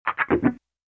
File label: Fast Wah Funk Guitar